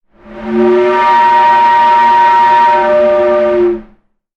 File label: sound design effect air pipe pressure